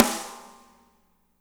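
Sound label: snare right; Spectrasonics; Burning Grooves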